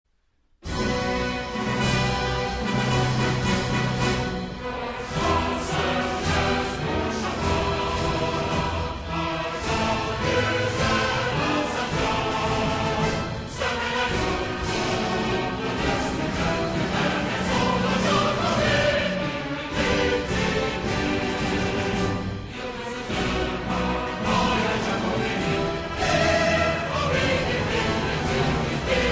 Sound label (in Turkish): istiklal marsi ses